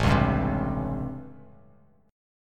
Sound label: A 7th Sharp 9th; A7#9; strummed; JGuitar